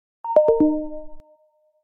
razriajennaia batar; miui 9

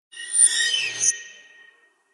skrejet metalla

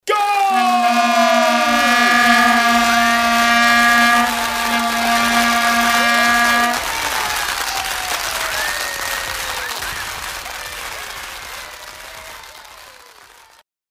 zvuk krika gol i vuvuzely

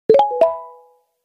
zariad nokia

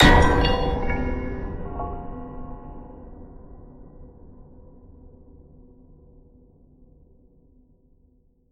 skrimery

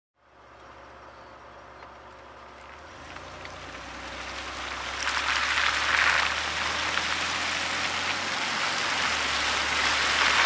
Mashyna edet po sliakoti

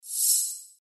zvuki magii fei

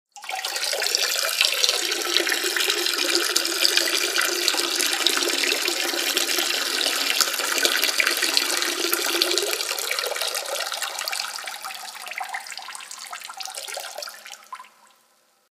zvuk pisaniya v unitaz

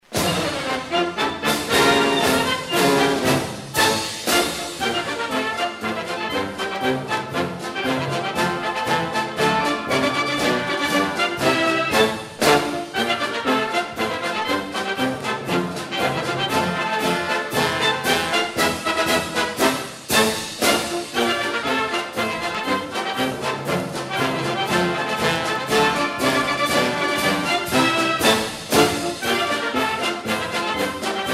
marshevaya muz

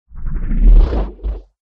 vrashatelnyi effekt poyavleniya

